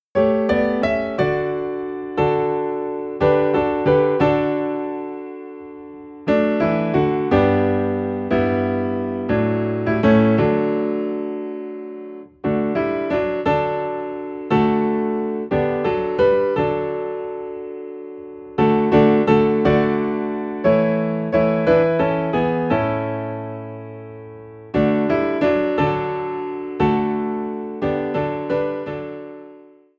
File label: Piano in D; Blessed Assurance